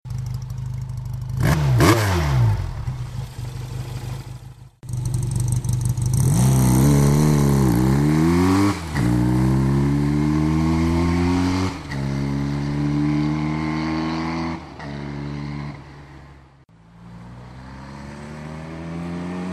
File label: Benelli Tornado; 1973